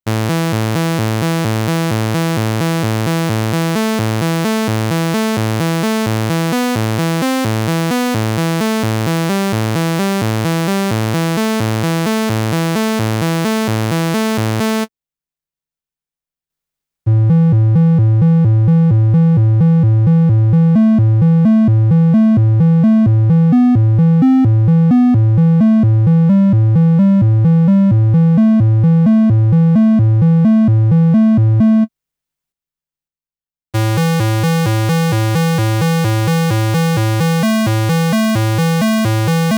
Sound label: vc lfo